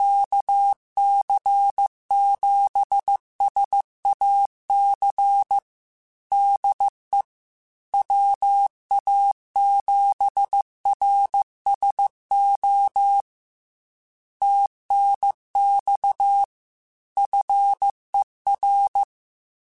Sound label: Morse Code, QSOs